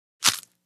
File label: Candy Bar Crunch Bite